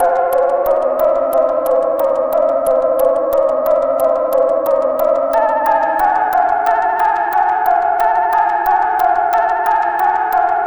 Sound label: Synth Pattern; Electrocution